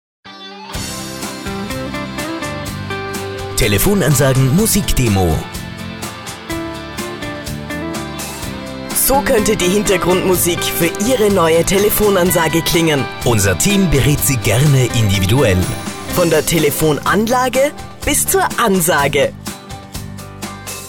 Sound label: Popular Rock